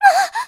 Gasp; Zelda gasping (hearing Link's news)

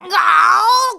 Lift Heavy; Link lifting a heavy object; zelda

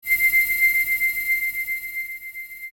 Appear; Spiritual Stone; zelda; OOT

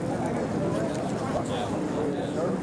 Market Crowd; Hyrule Market

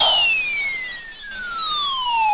Fall; Tingle falling

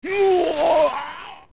Hit; Ganondorf; zelda; OOT

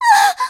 Gasp; Zelda gasping (cornered by Yuga)

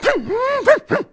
Grouch; Tingle

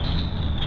Scythe Trap; Shadow Temple; Zelda; OOT

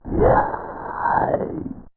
Wind; Wind from tornados near the Graveyard

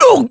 Hurt; Ganondorf

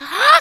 Dive; Link diving underwater; zelda

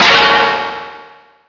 Shield Break; A player's shield broken; Zelda

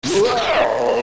Hit; Phantom Ganon; zelda; OOT

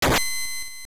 Sword Shimmer; A sword shimmering sound (heard on both games' title screens and when Link holds up a new sword) (also Veran posing

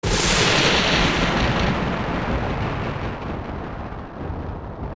Die; Phantom Ganon; OOT

